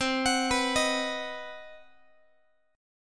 C Minor Major 7th Flat 5th, Guitar; arpeggiated; 4A,4E,5A,5D,5G#,6C#; JGuitar

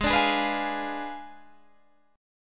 D Diminished 7th G sharp

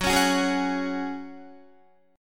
Gb Suspended 2nd, Guitar 4E,4A,5D,5G,5C,6F; strummed

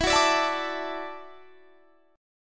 E 7th Suspended 2nd Suspended 4th, down; E7sus2sus4; strummed

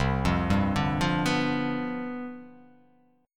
C Major 7th Suspended 2nd Suspended 4th

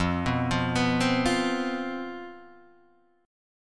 C Major 7th Suspended 4th, F, guitar chord

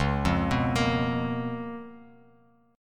C sharp 13th, Bass Standard; arpeggiated; C#13; JGuitar

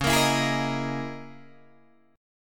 C sharp Minor 6th Add 9th, Guitar, Drop D

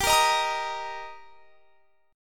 G Minor Sharp 5th G; Gm#5 strummed; x,22,20,20,23,23

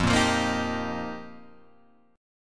F 7th Suspended 2nd Suspended 4th; F7sus2sus4; strummed; JGuitar

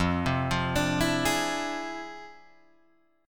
F Minor Major 13th, Guitar, DADGAD

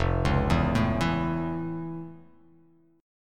F Augmented Major 7th, chord; F+M7; arpeggiated

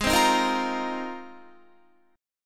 G sharp 6th Add 9th, Guitar; G#6add9; strummed

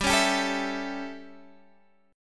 A 7th G, chord

